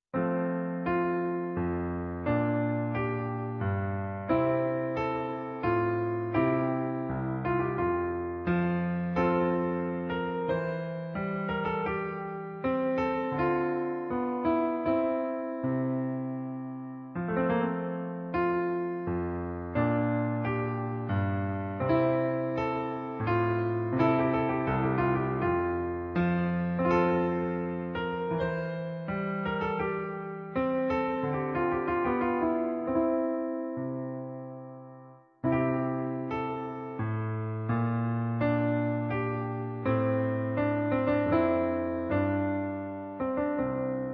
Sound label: sarabande; froberger johann jakob